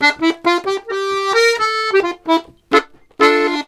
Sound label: Accordions; USB Soundscan vol.40; AKAI